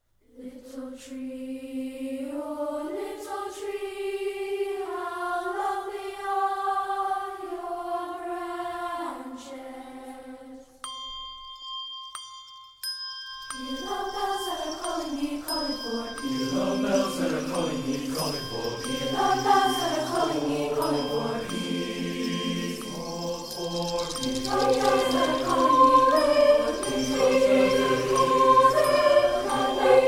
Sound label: SATB